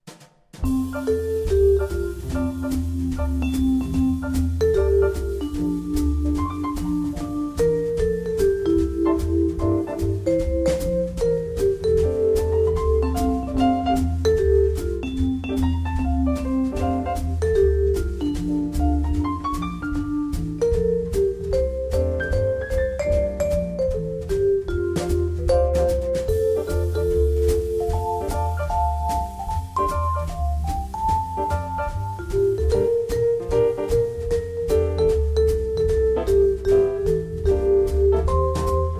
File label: Jingle Bells